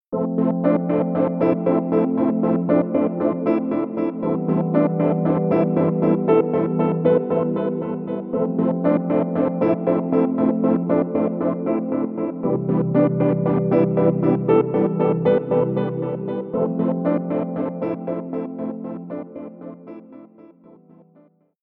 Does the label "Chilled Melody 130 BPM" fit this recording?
Yes